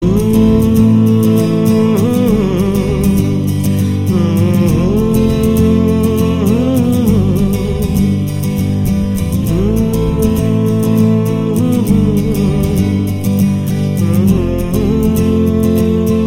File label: acoustic ringtone